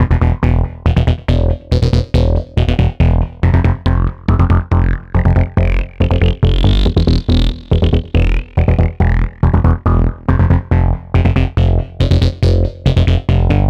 NRG Bass, Trance; Sonic Foundry (Sony Creative Software); Trance NRG VOL-1

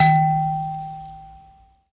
PLK Grand Mbira; Roland LCDP11 Africa VOL-1; M'biras

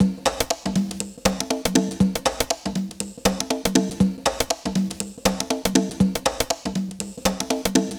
Conga Loop 120, Perc Loops, Loungin House; Equipped Music

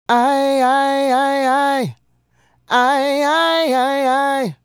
Male Solo, Hip-Hop and RnB Vocals

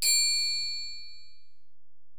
Ac Perc, Drum Machines Alesis DM-PRO

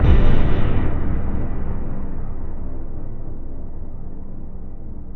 JV-1080

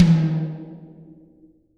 toms, Real Mega Drums; Best Service; Real Mega Drums VOL-1

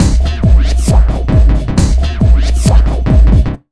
Big Beat Drumloops, 135

